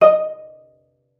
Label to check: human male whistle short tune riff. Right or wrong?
Wrong